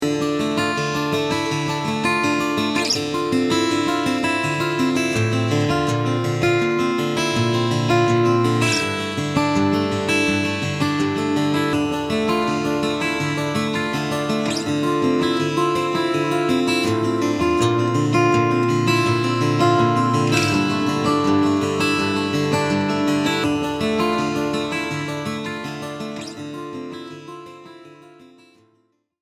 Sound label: Fingerpicking